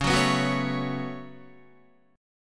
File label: Db 7th Suspended 4th Sharp 5th Guitar; 8,9,11,11,9,9; 4F,4A,5C,5G,5C,6E; strummed; chord shape; JGuitar